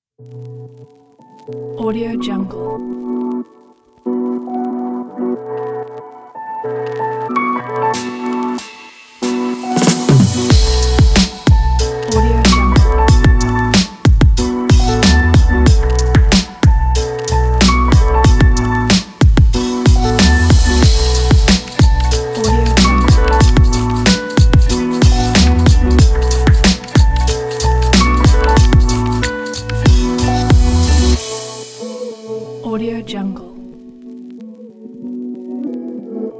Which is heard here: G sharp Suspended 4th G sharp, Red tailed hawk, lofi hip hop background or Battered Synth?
lofi hip hop background